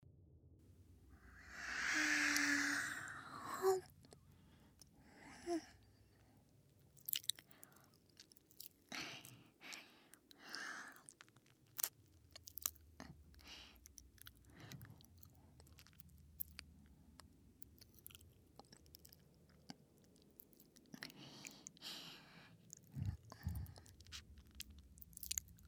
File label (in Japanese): 右耳舐め; 02夜 02 モノノケの右耳舐め; うたかたの宿 春寒のご馳走